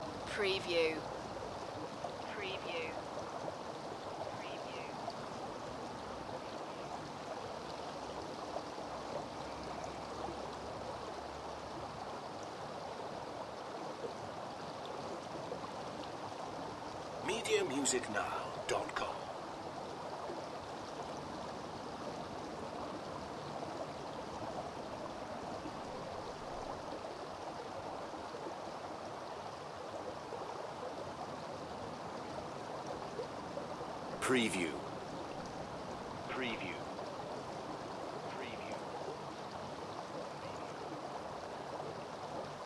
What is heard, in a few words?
Stream into the lake short - at a distance - night; beanstalk audio